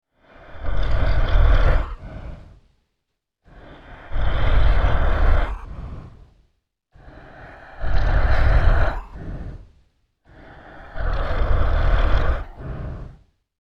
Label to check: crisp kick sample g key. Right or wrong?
Wrong